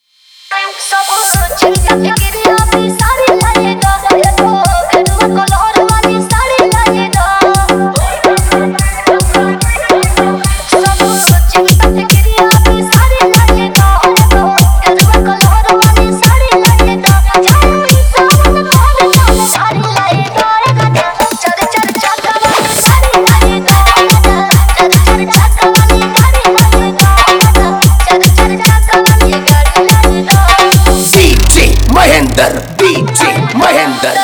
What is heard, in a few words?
Bhojpuri Remix Song